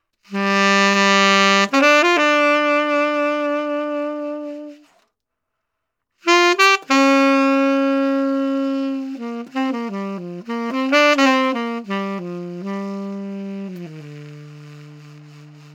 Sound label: Blues